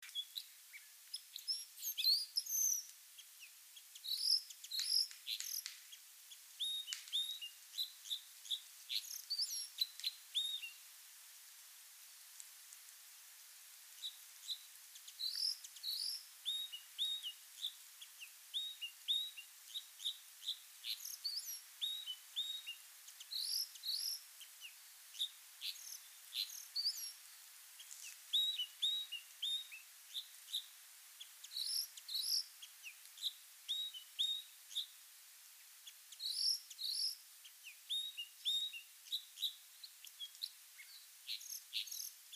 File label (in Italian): Crociere